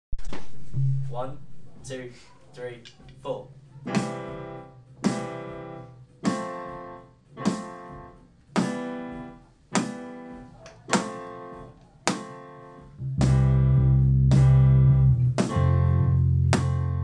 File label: without vocals